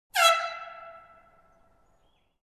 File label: Air horn